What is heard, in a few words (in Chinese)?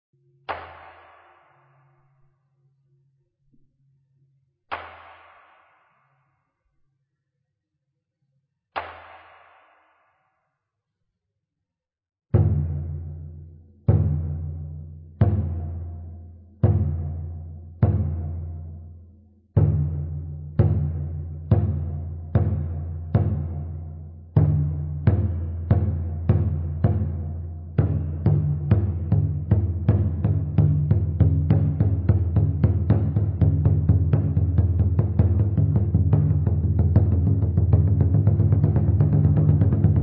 鼓三嚴